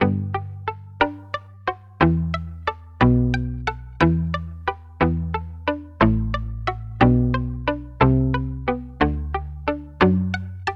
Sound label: palm muted guitar bach